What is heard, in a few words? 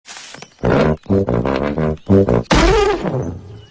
tuba knocked out